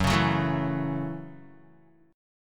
Gb Minor 7th, Guitar; strummed; JGuitar

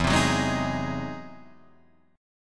E Augmented Major 9th, Guitar, Open D

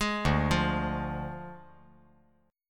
D Diminished D

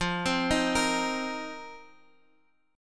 F 7th Suspended 4th; F7sus4; x,x,3,5,4,6; arpeggiated

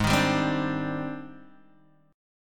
Ab Major Sharp 11th Ab down Guitar Open G

